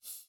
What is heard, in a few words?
man sniff; angband